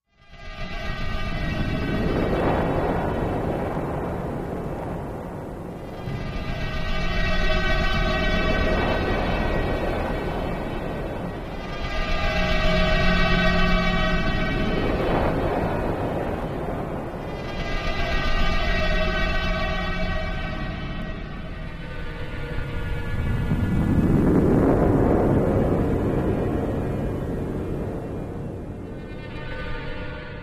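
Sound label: One Minute More Solar Wind, musical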